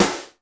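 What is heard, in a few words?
GATE SNARE; Crypton - DrumBase5000; GATE KITS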